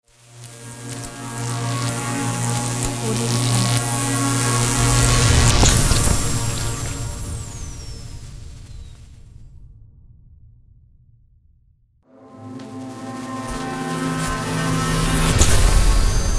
electricity logo

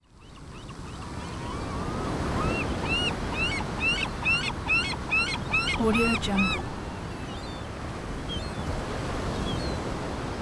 sea breeze and gulls

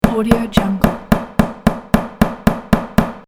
hammer on wood fast

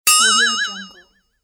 cartoon flexatone comedy accent

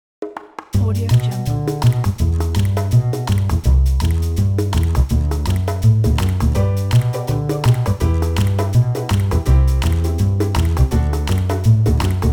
summer background; whaleproject

